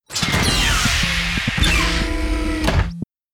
Stereo Mechanical, notifications; Motorola; Edge 70 Max China

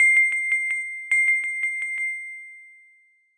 Wine Glass, Ringtones; Samsung; Gear S2

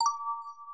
Lock; Nokia

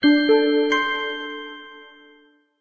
Searchlight, notifications; Xiaomi Mi3

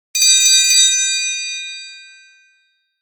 Bells, Nokia E7-00 digital

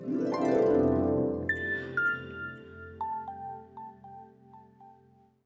Power off classic; Samsung SGH-E500

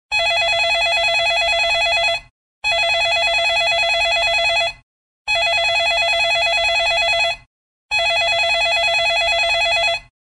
Basic piped ring; Samsung; GT-S8500 Wave China; Ringtones

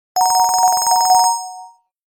Telegraph, Nokia tones digital; N78

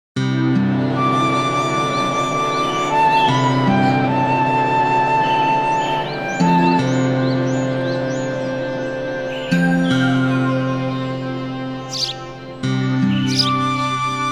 Golf videoringtone; Nokia; audio themes

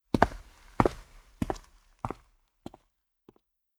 Stereo Footsteps, notifications; Lenovo; ZUI 13

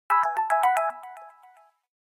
A toy watch, alarms; Samsung Galaxy-Pop-India

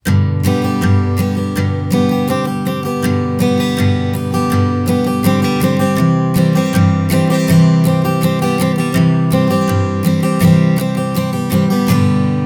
Acoustic, ringtones; Marshall; London KB 1501

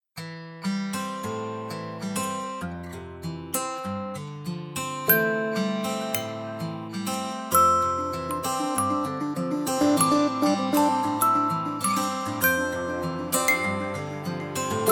Guitar Heaven, alarms; Huawei; P8 Lite

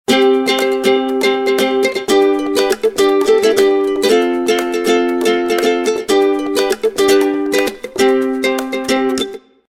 Ukulele

